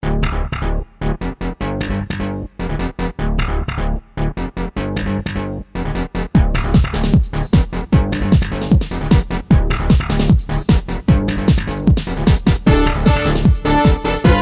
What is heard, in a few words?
ringtone techno, instrumental